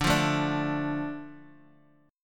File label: D Minor D Guitar DADGAD